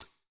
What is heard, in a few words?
50 cal Hit; Red Hot Vengeance